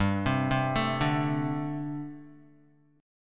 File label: G Suspended 2nd Suspended 4th, Guitar; Gsus2sus4; arpeggiated; 2,3,0,2,2,x